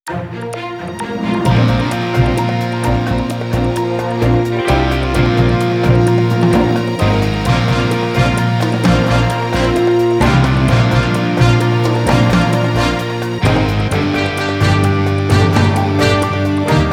Recap Beat; FirstCom

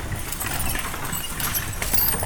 Horse Drawn Wagon By; Electa Quinney

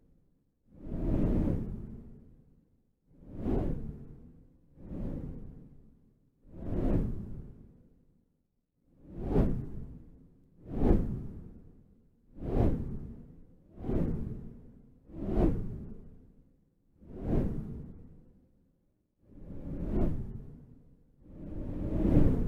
Wooshes Windy

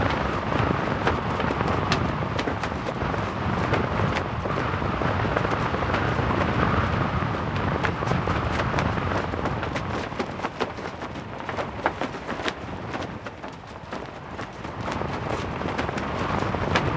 Sail, Flaps, Busy, High, Wind; Poetry Project

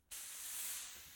Air Brush Bursts Var Long; Electa Quinney

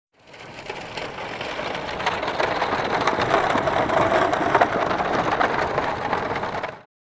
Horse & wagon; JERRY APPS WINTER

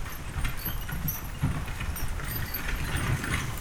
Horse Drawn Wagon By; Electa Quinney

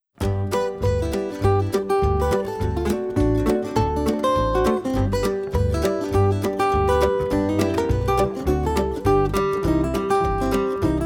blues; Milwaukee; Trains

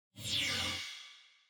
Swipes

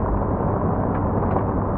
Car Road Noise; Poetry Project

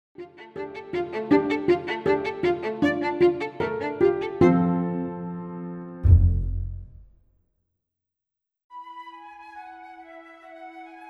chanting; Geshe Sopa; Wis Bios

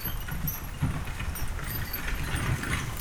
Horse Drawn Wagon By; Electa Quinney

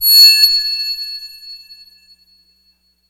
Crystal, Strike, Longer, Ringing; Universal SFX

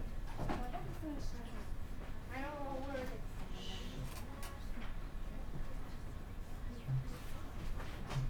Classroom, Grade 4, Subtle; Electa Quinney

